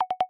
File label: Push To Talk Unmute Fail; iOS Sounds